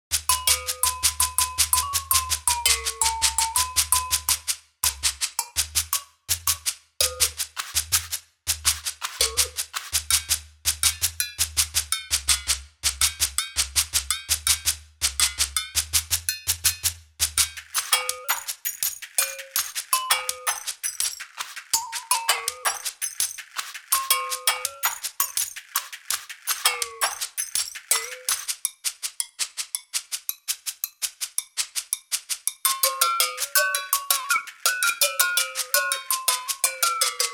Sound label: World Percussion